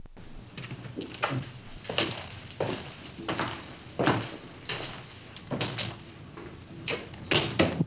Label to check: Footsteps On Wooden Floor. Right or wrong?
Right